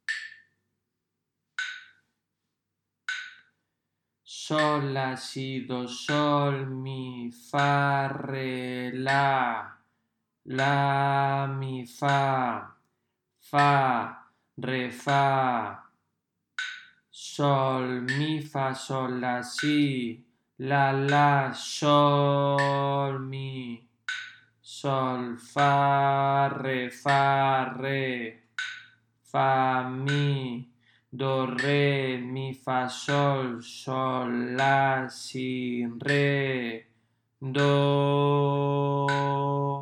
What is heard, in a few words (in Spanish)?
RITMO